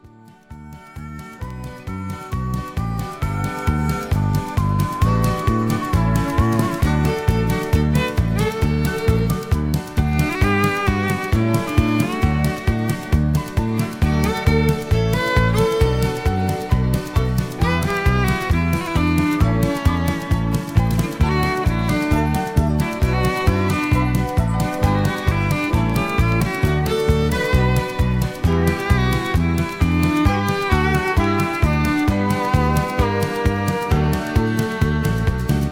Instrumental

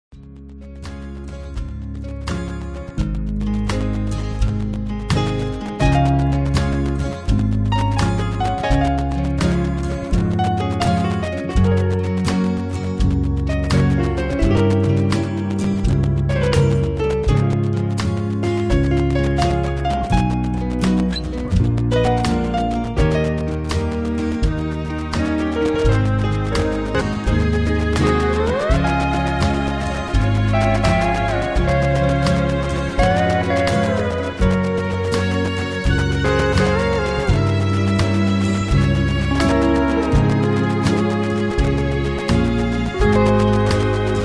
Foxtrot, Instrumental

